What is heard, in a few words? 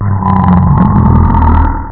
BAD GRUNT; riptide